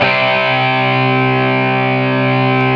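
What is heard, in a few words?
GTR POWER, Power Chords; Metal Chds; Club-50 - Foundations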